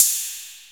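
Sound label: 808 RD, 808 ride; E-Lab; X Static Goldmine 1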